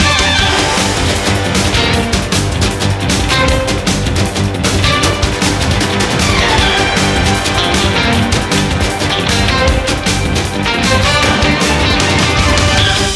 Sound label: Boss fight